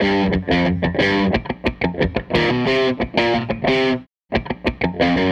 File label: Les Guitar Loop